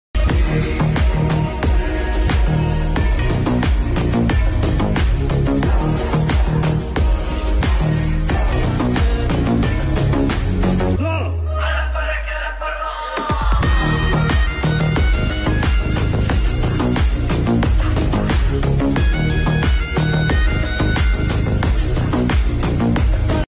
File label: melodic bgm